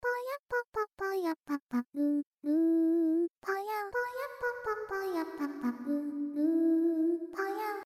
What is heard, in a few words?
Reverb